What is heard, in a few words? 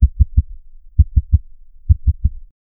slow deep thumping heartb